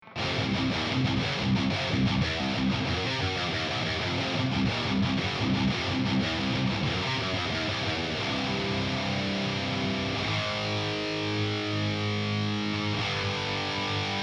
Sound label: amp; huge amp shootout